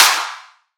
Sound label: Riddim Clap